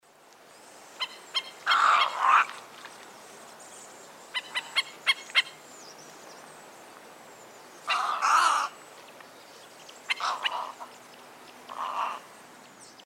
Snowy Egret Harsh Calls

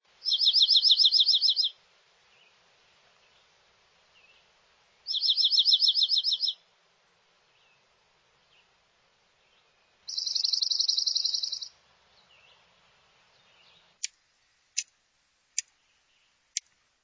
Dark eyed Junco

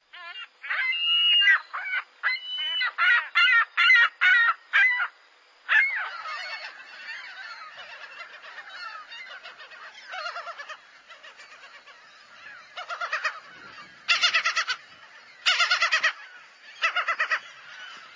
Ring billed Gull

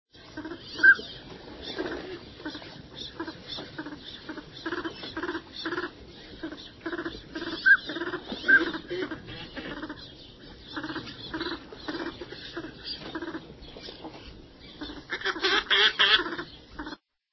Northern Pintail Duck

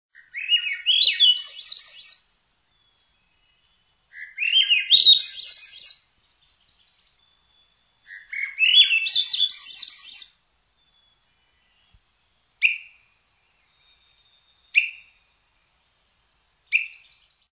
Birdsong Swainsons Thrush

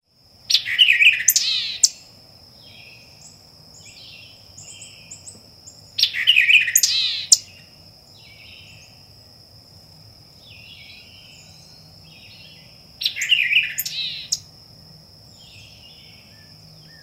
Bird Songs White-eyed Vireo

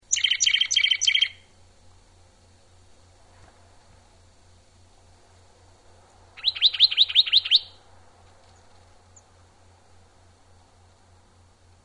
Juniper Titmouse

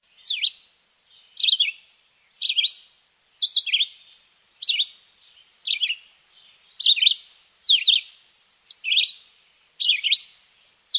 Red eyed Vireo

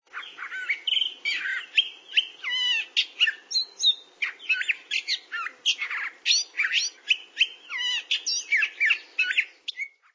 Long-billed Thrasher

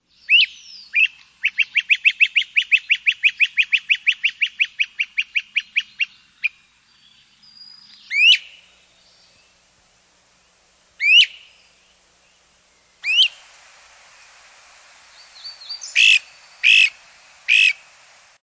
Great crested Flycatcher

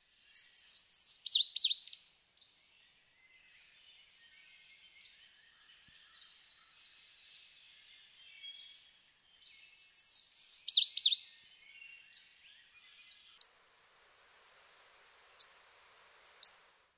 Louisiana Waterthrush

